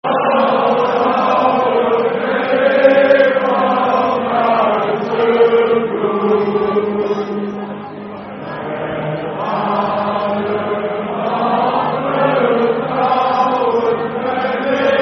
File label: Dutch National Anthem Football Chant (Soccer Song); fanchants; Holland Hfa Netherlands